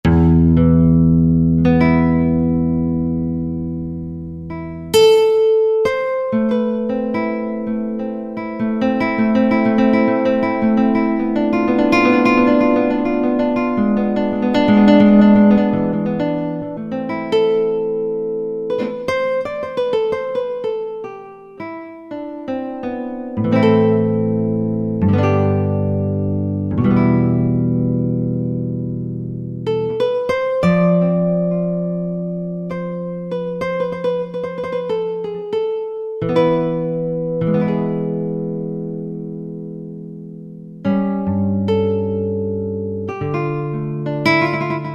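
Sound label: Classical Guitar, Roland SR-JV80-02 Orchestral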